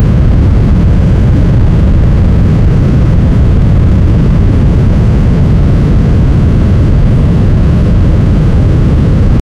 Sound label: yacht engine sound; watercraft